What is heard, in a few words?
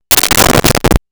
Head Hitting Metal; Impacts; SONY PICTURES SOUND EFFECTS SERIES